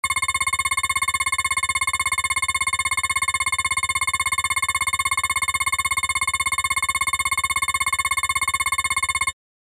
Scanner Pulsing Dull, drones

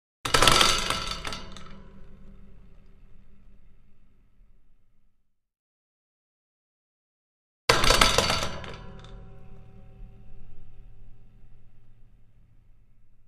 Small Metal Gate Vari

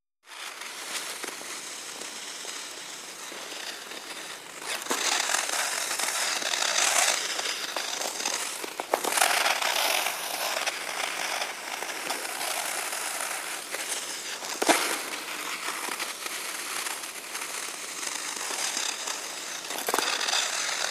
Hockey Single Skater, ice skating

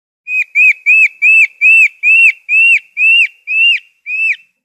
Monkey Ringtail Call

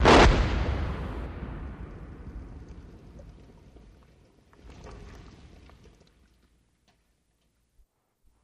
Cannon Mortar Shot

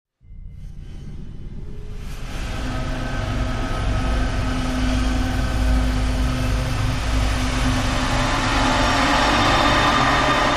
Low Sweeping, metallic

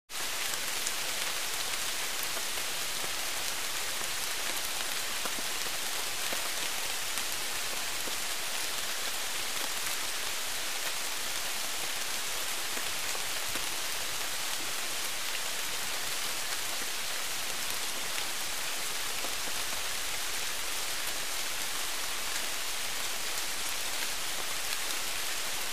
Light Tropical Rain

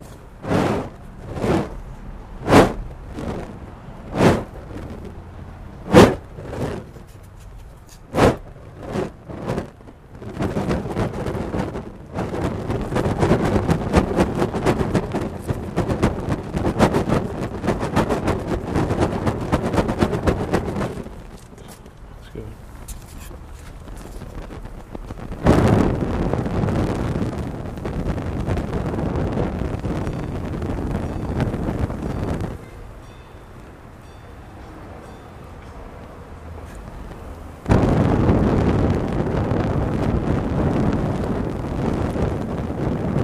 Blowing On Torch Fla, torches

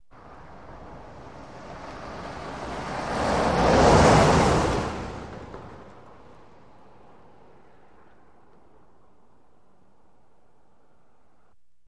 Car By Medium

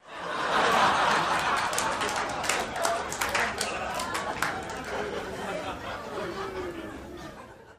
Laughter Appls Med Cr; medium

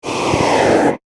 Slow Whoosh Flying Th

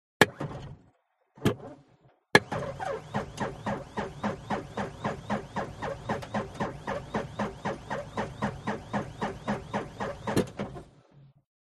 Tiger Moth

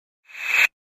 Pop Up Elect Rattle Wa, pop up element

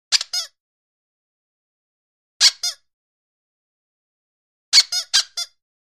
Rubber Squeaks High

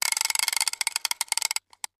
fish reel slow; fishing reel

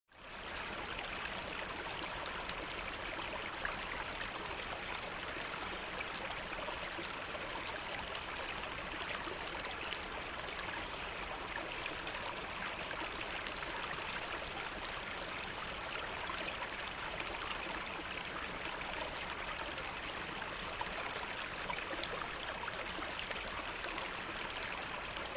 Creek Stream Medium

